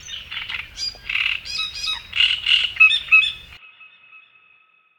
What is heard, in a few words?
ambience bad birds; smite; conquest